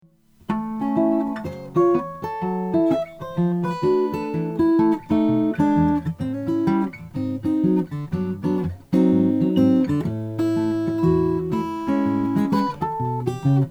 mcpherson sable carbon fiber

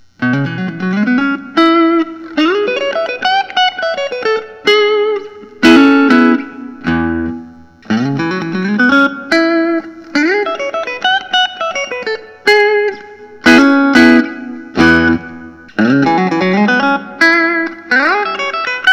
fender cs 67 telecaster nos aged vintag

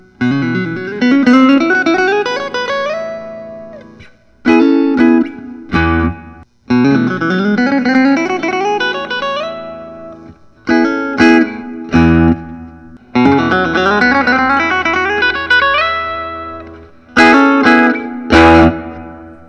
hahn 1229 mahogany t styl chocolate

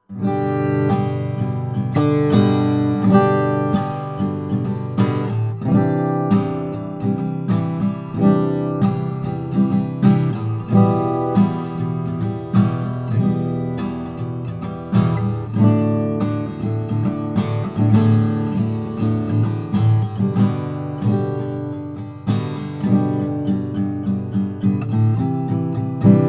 olson lefty sj cutaway on indian rosewood

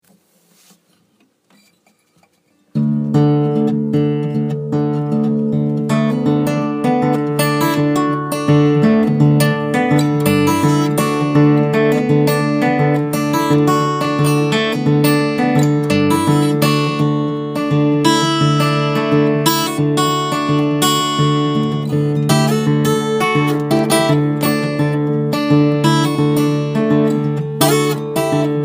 gibson country western natural

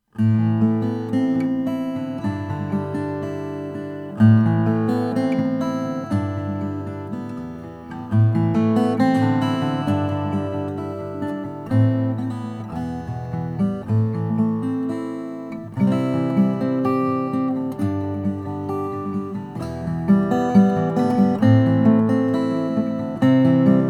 osthoff grand parlor fiddleback

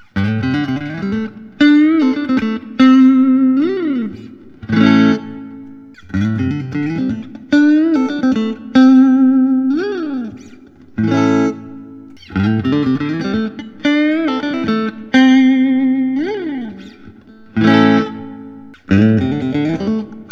Fender Custom Shop Showmaster Standard; jet

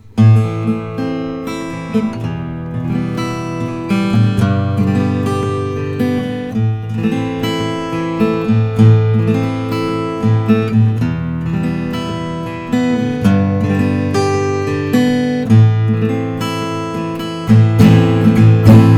martin 0 16ny natural

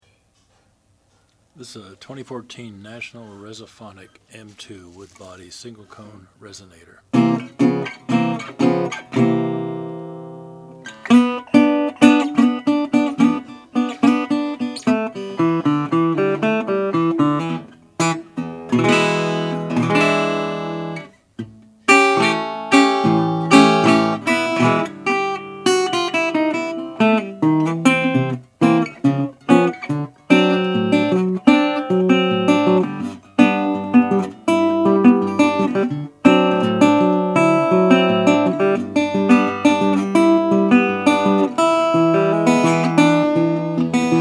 National Resophonic M2 custom mahogany

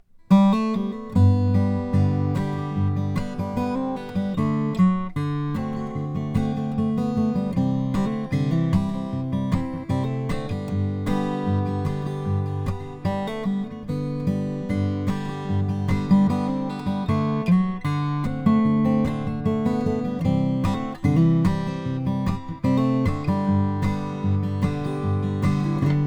Collings SJ short scale indian rosewood

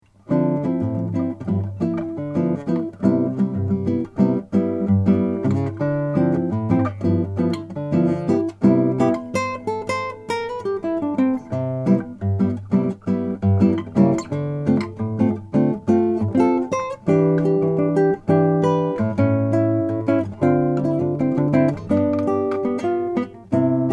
michael thames concert classical

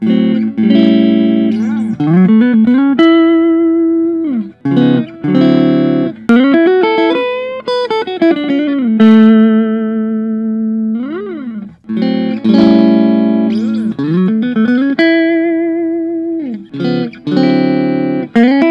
gustavsson fullerblaster

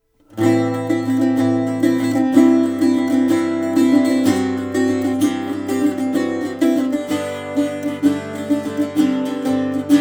Irish bouzouki cocobolo

